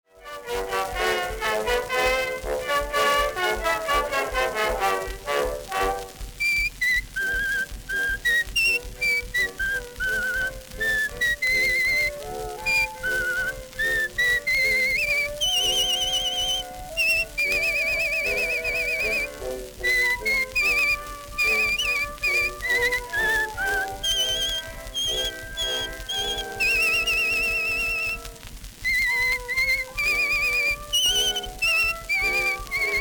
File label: whistling and yodeling special